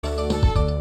Lose Jingle; Wacky Races Starring Dastardly Muttley; PS2

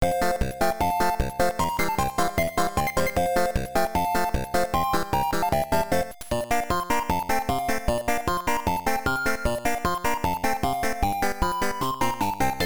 Percussion Head; SD Snatcher; MSX2

